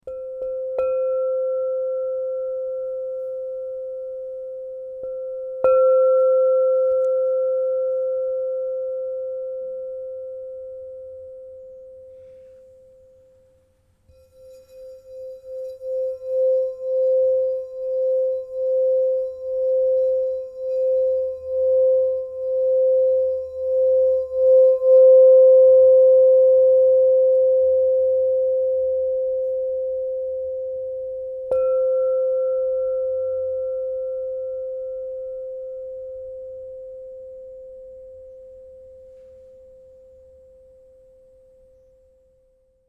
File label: crystal tones singing bowls